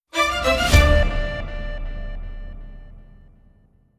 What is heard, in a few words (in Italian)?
sting urgente